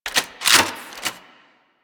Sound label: Fist Equip; DE; Warframe; Weapons; Archwing; Melee; Grn Arch Regor Fists